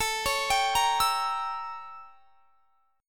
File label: A 7th Flat 9th; A7b9; arpeggiated